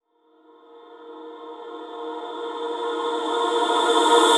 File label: Reverse Vocals A#; Vengeance - Essential Clubsounds Vol.4; Effects